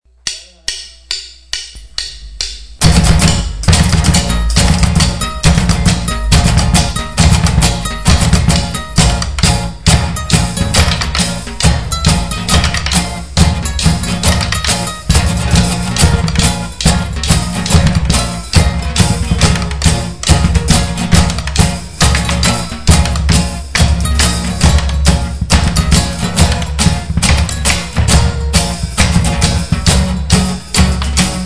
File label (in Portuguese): batidas na baqueta